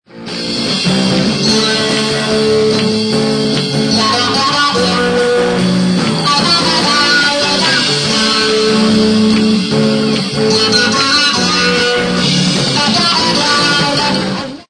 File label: Talk Box